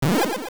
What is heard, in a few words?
Enemy Hit Power; An enemy hit WITH POWER